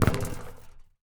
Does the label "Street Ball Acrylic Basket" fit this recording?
Yes